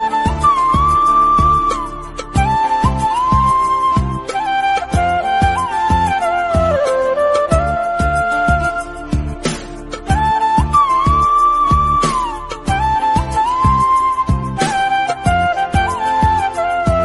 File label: Telugu Film Background Music